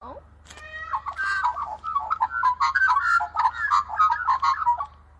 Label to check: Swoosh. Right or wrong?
Wrong